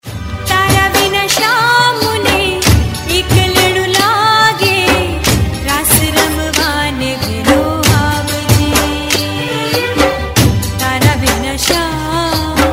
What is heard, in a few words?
Dandiya